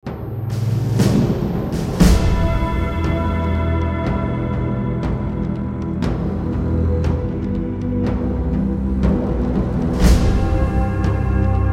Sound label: Boss Music; crypt of the serpent king; ps4; xbox 360